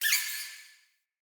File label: Vocal Single Squeak, Animals; Player; Player Floppet